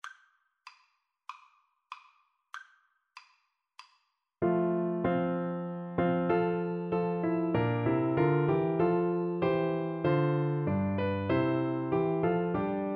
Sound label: Navy Hymn BN, Bassoon